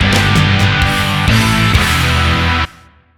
Music Sting; Menu FX

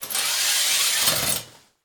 Morgue Curtain Open; Medical; Blastwave

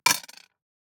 DICE on Glass, Throw and Roll, Metal, Two Dice; Samples; ArticulatedSounds; DICE Sfx Party (Extended)

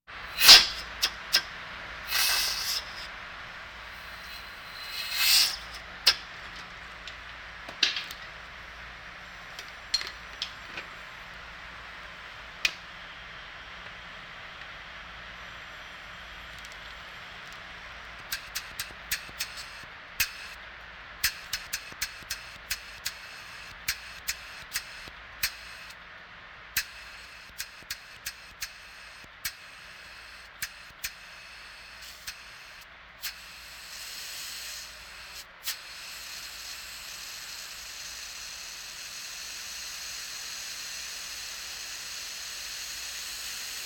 Hospital effects

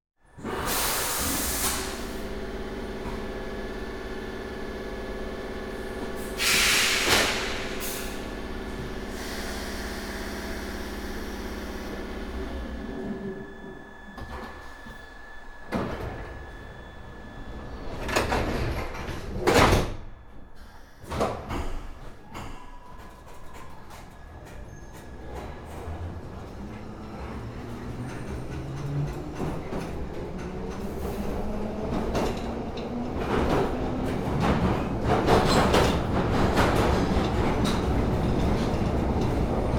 Underground trains ride interior (London); Travel & Transport

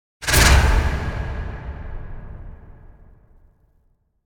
Impact Whoosh Airy Medium Vibrating- Finale; Hollywood Edge; sound designer's toolkit v2

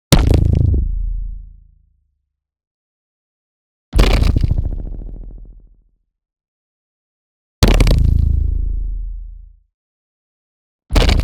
HIT LARGE Distorted; NEON; Distorted Reality; boom library

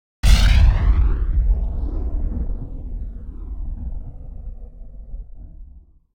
Cannon Firing A Rocket; Hollywood Edge; sound designer's toolkit v2

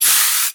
Aerosol Can Long Spray, Bathroom; 1001 Sound Effects

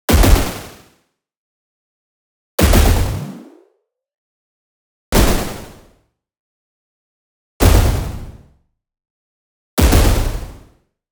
IMPACT WHITE NOISE Burst Bounce; boom library; Anime Essentials

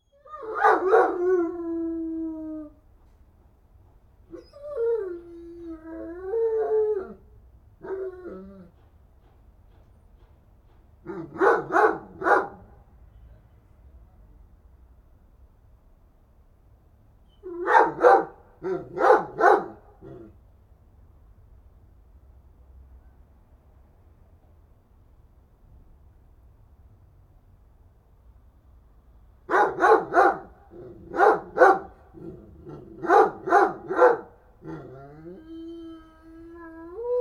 Animals dogs; Birds, Insects & Animals

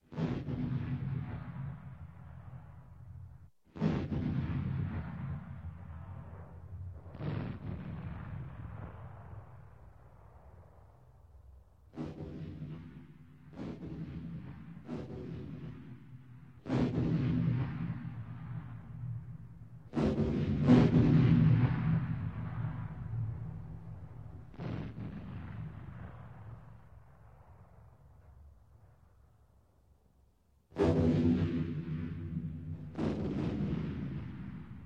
Anti Aircraft Guns; Sound Ideas 2000 Series

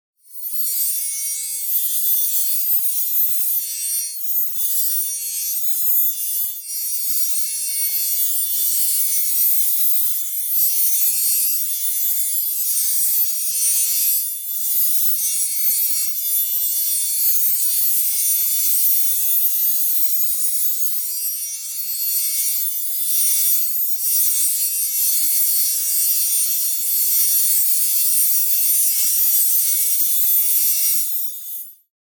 SHIMMER Glint Movement Constant; boom library; Anime Essentials; DSGNMisc

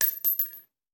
Bullet Shell; Sound Ideas; Guns

